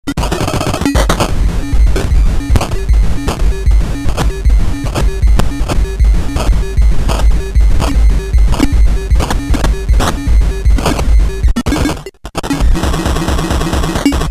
Effects Chopper Lowpass

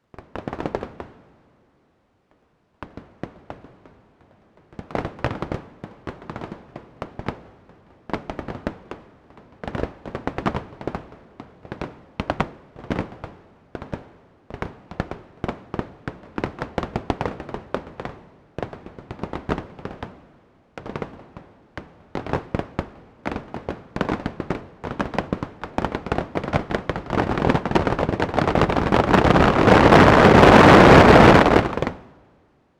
Fireworks, eurorack; 2xDICE2

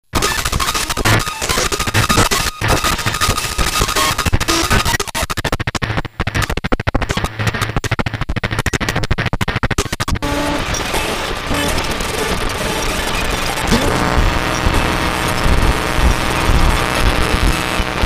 casio ct 380; circuitbending; Gijs Gieskes

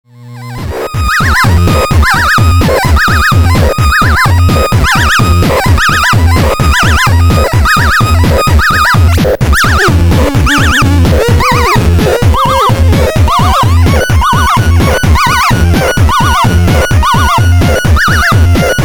Seq Plugin and LSDj; Gijs Gieskes

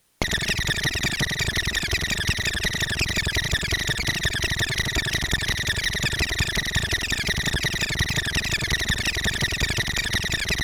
insects, gameboy; Gijs Gieskes

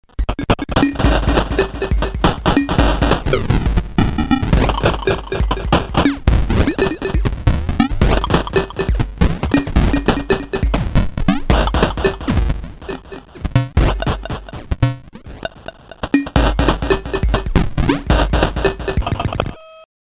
Effects Delay, hard soft synth

